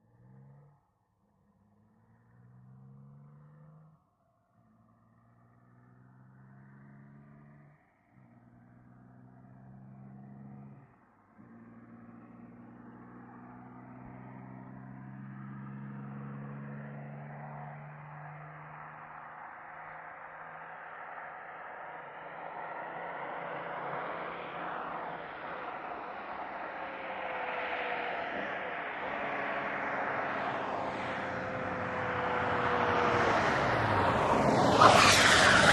VEHICLES ASTON MARTIN: EXT: Long fast approach with skidding stop.